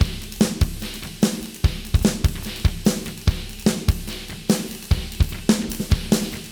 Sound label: Stranger 147; Zero-G; Monster Beats